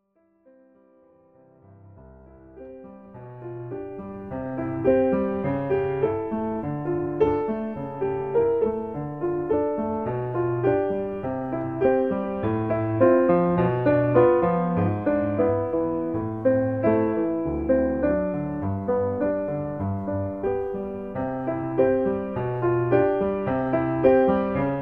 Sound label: LOW VOICE, piano; schumann